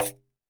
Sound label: percussion, Roland TD-20